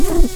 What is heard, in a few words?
Lapidarius queens, bumblebees